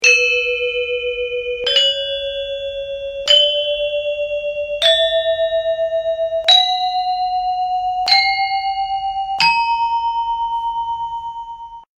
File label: scale